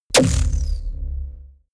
sci-fi plasma cannon fire deep space

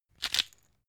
glass pepper grind single; berufe; gastronomie; soundpool

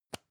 lipstick open soft; haus-garten; badezimmer

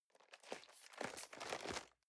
cardboard box medium turn soft; objekte

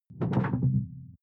sci-fi alien bloom stop; swooshes-swishes

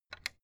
fence gate latch rattle short; objekte; tueren

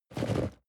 cardboard box medium shake soft short; objekte

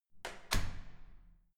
security door close soft

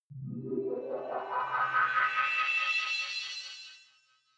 energy generator start settle

